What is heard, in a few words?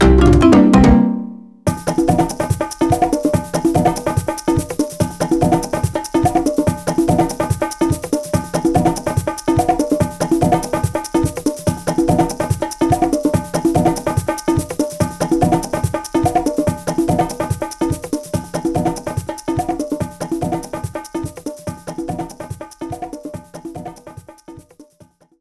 beach boss fail bpm144